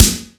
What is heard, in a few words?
crispy snare sound a key; snare drum samples